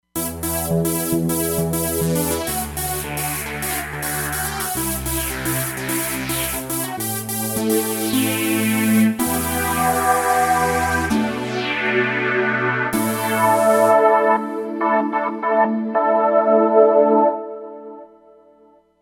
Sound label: Roland Fantom XR; Wet Atax